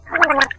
gurp; quake2; karrot

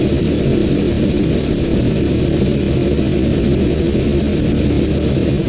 flare his, weapons; quake2; zaero

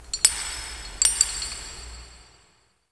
chain clink; quake2; chaos

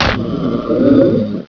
fall; quake2; bauul

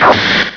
bump; quake2; dalekx

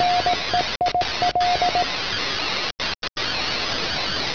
buzz; quake2; inland

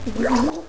gurp; quake2; buttercup

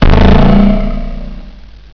fall; quake2; prabbit

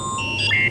pain; quake2; qbot

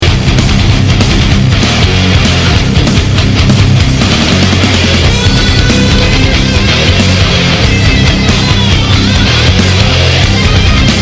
solo; quake2

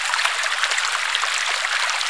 stream; quake2

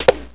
bump; quake2; magikoopa

